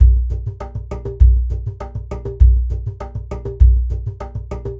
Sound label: Fat Box, percussion; Sonic Foundry (Sony Creative Software); Joe Vitale Latin Percussion